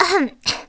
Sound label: "Ahem"; Mila; while working; Zelda